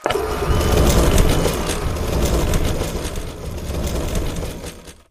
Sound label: win spin reel animation; Racetrack Riches; megaboard